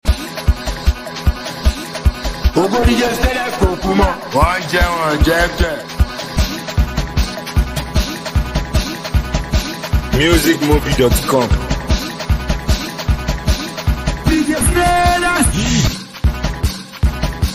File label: Movement Beat